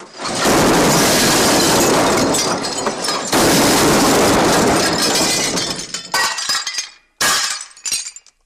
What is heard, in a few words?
Glass Break Big Lots, shards